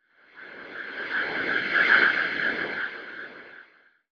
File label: dark scary drone